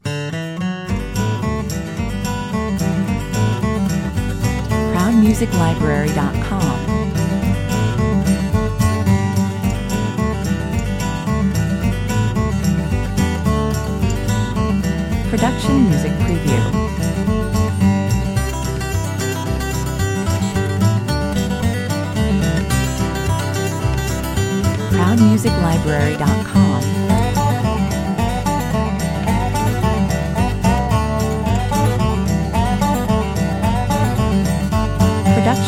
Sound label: A cosy and fun bluegrass country track with acoustic guitars and dobro, 110 bpm; Great outdoor material, playful and fun